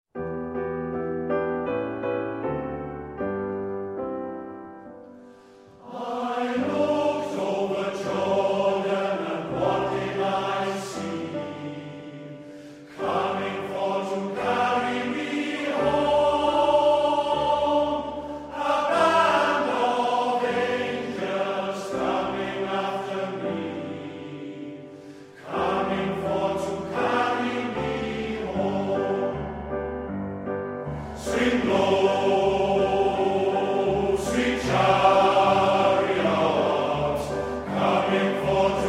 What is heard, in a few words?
Choir, Wales; Swing Low, Sweet Chariot; Negro Spiritual; The Morriston Orpheus Choir